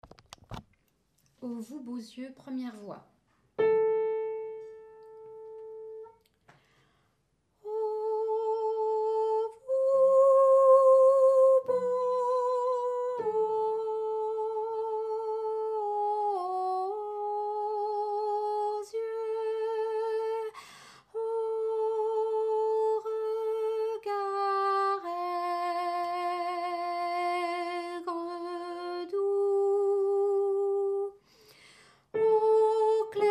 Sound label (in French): 1iere voix; Oh Vous beaux yeux